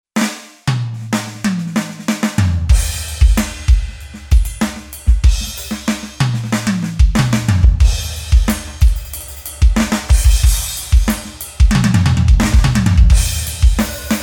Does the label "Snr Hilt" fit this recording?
Yes